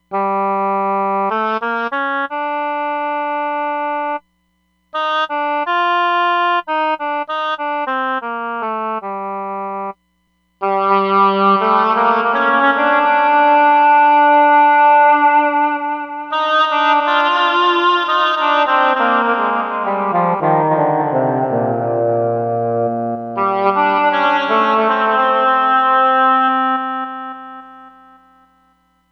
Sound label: Slow Chorus Echo, Roland DEP-5; Factory Patches